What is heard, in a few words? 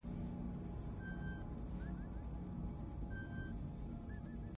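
games gallery background constant loop; avengers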